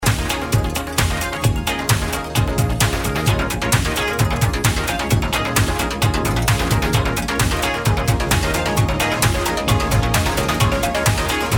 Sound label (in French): Accord